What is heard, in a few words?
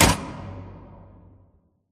Giant Pillar Pods Stop; Titanfall 2; Boomtown